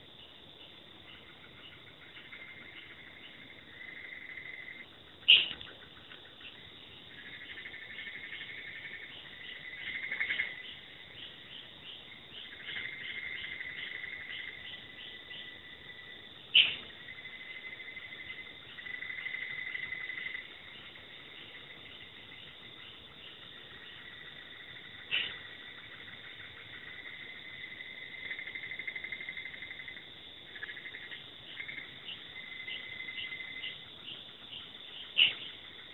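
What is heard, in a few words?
rhacophorus cyanopunctatus, Blue-spotted Bush Frog call; Singapore, 30 Apr Jan 2014, 2235 h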